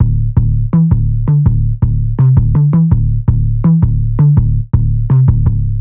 Sub Bounce; Timbo

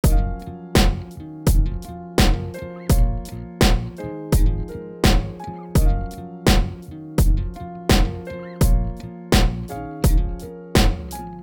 Pad; Pad Playerz

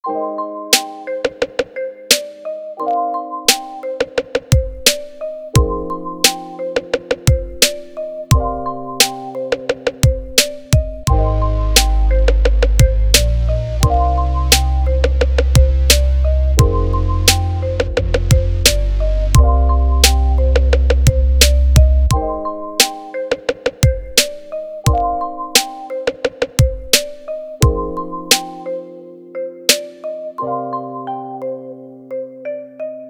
Modern 808s Quakes

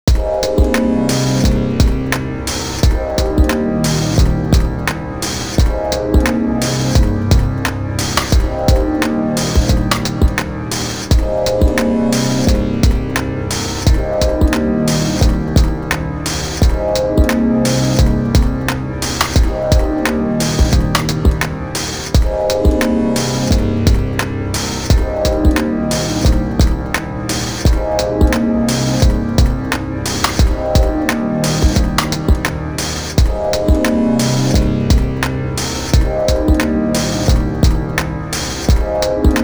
Atmosphere

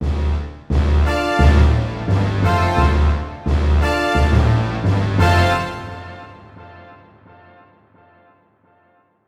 Horn; What u no About Power

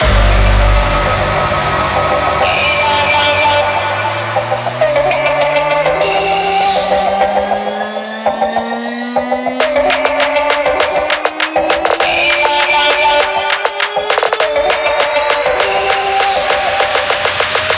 100 Gmin

